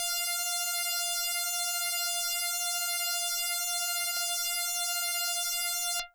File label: Electric Bass DX100 F5